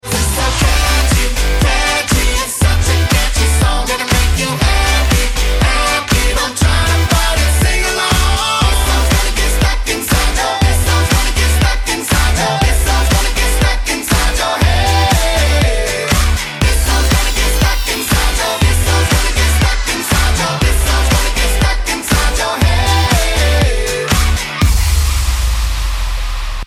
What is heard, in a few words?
catchy song; lego 2